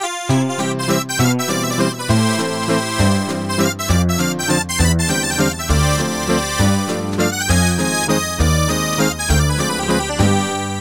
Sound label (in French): La Valse De Paris